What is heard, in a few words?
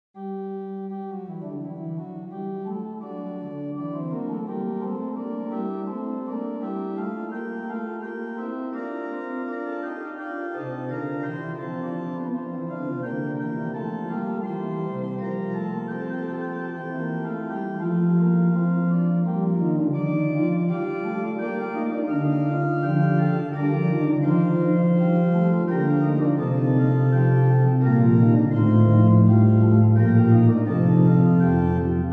Noel, Organ Music, Souvigny; Boely